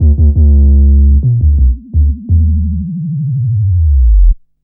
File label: Raw Bass, Drum & Bass; Fluid Dynamics